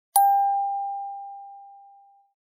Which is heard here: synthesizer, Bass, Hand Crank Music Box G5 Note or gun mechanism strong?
Hand Crank Music Box G5 Note